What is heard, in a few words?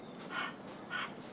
hah call